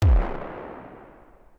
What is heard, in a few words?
explosion echo